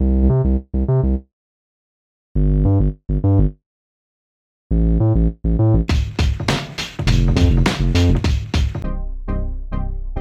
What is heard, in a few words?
generic game music